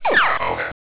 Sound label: phasers; rogue